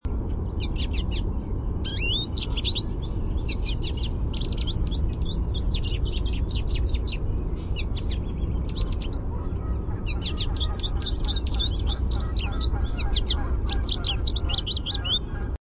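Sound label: contact song sweeet, American Goldfinch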